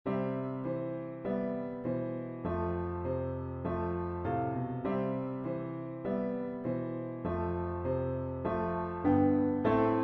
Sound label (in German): Klavier 4 Haende